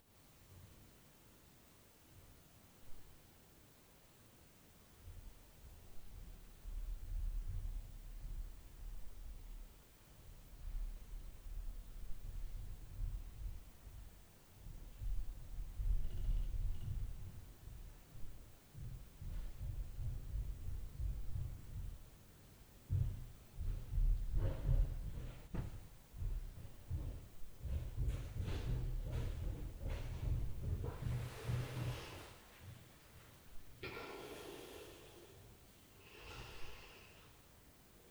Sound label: DOOR NOISE, Night Noise